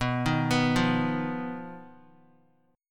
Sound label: B-Major Flat 5th B down Guitar; BMb5 arpeggiated